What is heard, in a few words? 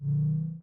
menu open; starwars; the force awakens